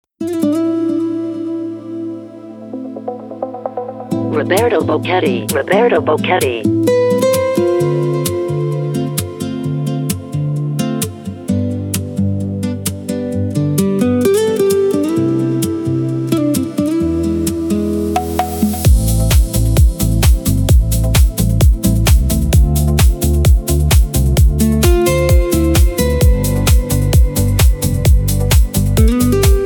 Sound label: Digital Sonic The Harbour